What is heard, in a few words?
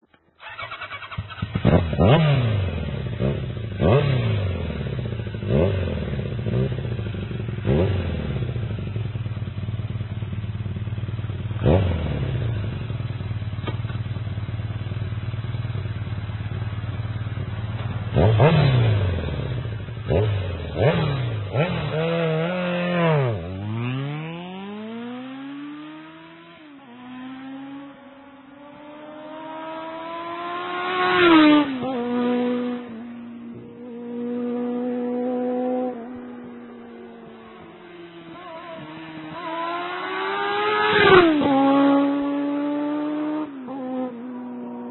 kawa 1100 zzr ext; Kawasaki 1100 ZZR; GSX-R